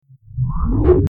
time start; FXHome

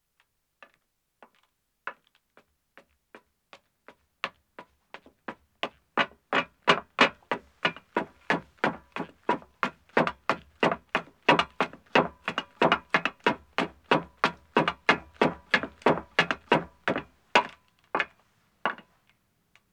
Metal Stairs Ascending, 1 Man, Footsteps; BBC Sound Effects Library; Footsteps II

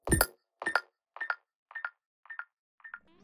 Tech, Weird Side Samples

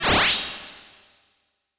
Various UI, UI Menu; David Dumais Audio

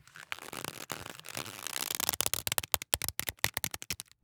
Leather Creak; SoundBits; Unsettling Creaks and Squeaks

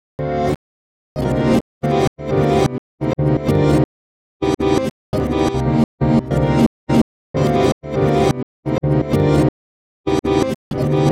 Loop Gminor 85 bpm; 99Sounds; Mangling Audio; Mangled Software; Midiception

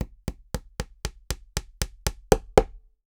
Nail Hammering; Construction & Tools; The Sound Pack Tree

